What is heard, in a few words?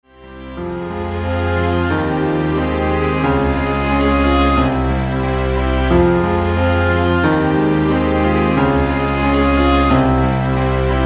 Penitential Act II Lord Have Mercy instrumental